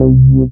SYN SH, Roland; Sound & Vision - Gigapack I CD 2

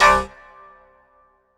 Dance Mega Synth; Best Service; 90 s Sample CDs

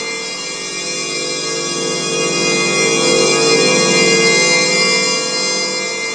A#3 laser; Northstar; The Wizard VOL-1; FLUROBELLS

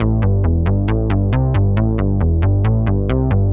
Soft 136 A, bass; musicradar; bass boutique

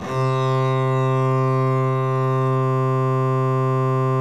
DBL BASS, Synclavier Strings; ILIO

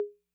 Congas Hi Med Decay, 808

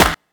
KIT, Dance Mega Drums, HIP; 90 s Sample CDs; Best Service

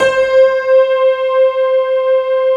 piano pad C5

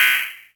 Percs, Elektron MachineDrum; SPS1 MKII

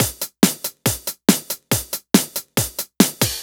Addon Loop, Drum Loops 140bpm, Perc Loops

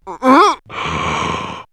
Fear Gag Breath; vox mix; X-Static Goldmine

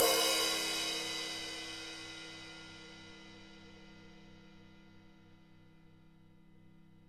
P Crash up; Digital Sound Factory

